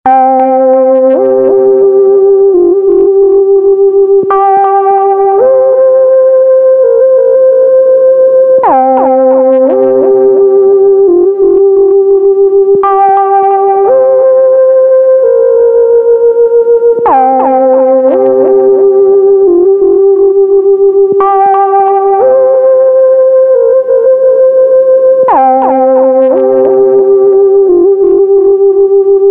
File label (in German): telefon